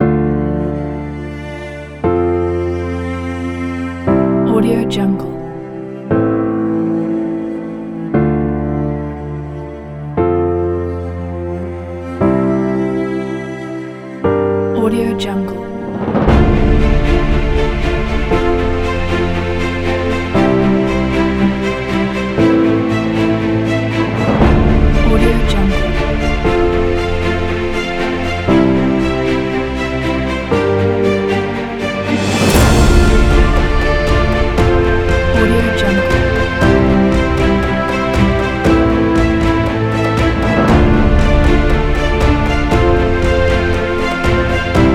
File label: inspiring emotional trailer